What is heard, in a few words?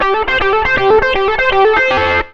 SOLO R, Electric & Acoustic Guitar Loops